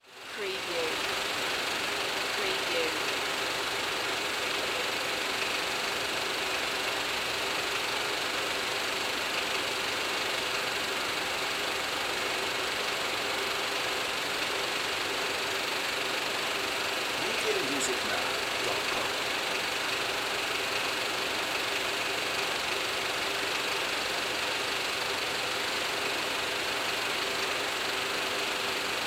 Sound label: Old Film Projector, Vintage Film Projector Run